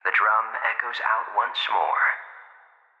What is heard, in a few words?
Diary Drum